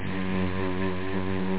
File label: buzz